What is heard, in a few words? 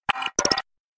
glitch